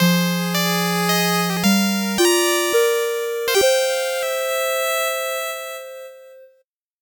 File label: 8bit jingle slow